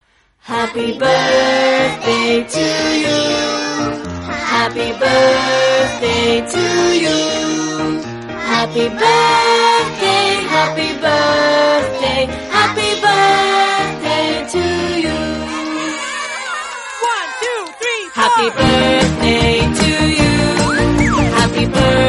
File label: happy birthday kannada song